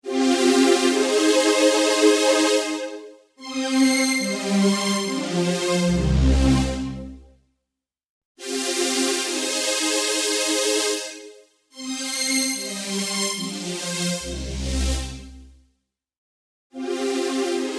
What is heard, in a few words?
Resonators Mix